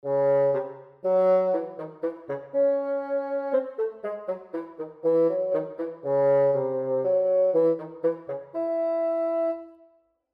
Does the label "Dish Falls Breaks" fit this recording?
No